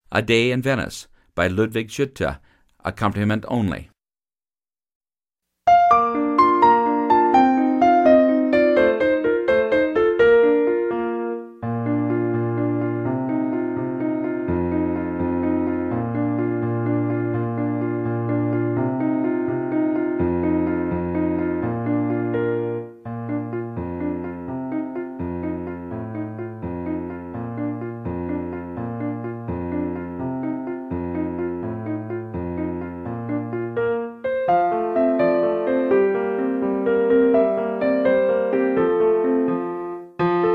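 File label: Horn Accompaniment only; French Horn – Piano Only – Performance Tempo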